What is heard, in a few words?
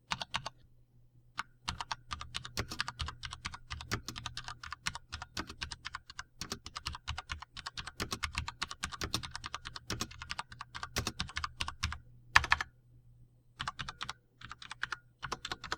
Keyboard recordings Diatec FILCO Majestouch; FKBN105M-UKB